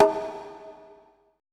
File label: BONGO 3 L; BPC DRUMS